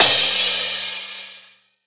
Crash

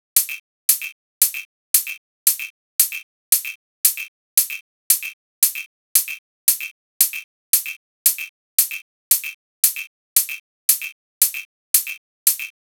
114 Heat Hats

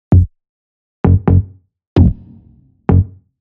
Synth 130BPM F-m Dark Sub

